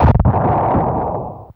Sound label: THUNDER HIT, Impacts, Hits & Booms; Distored Reality